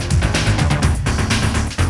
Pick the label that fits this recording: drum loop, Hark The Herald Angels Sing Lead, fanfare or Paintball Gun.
drum loop